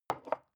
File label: glass put down tipping